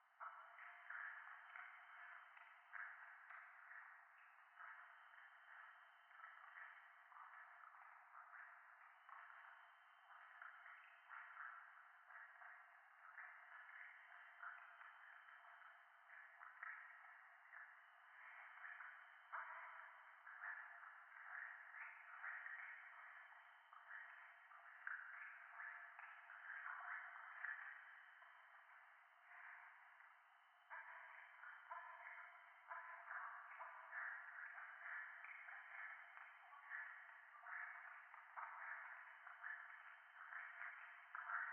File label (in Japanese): 廃墟 フェラチオ 山奥